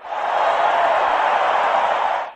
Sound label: crowd winning; BALLY